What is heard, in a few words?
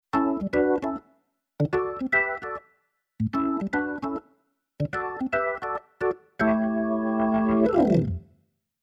Keyboard Organ Jazz Organ